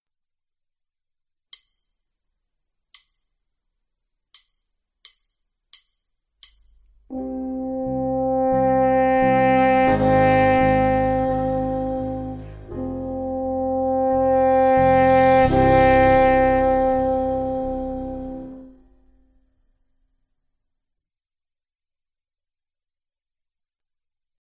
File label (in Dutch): Crescendo en Decrescendo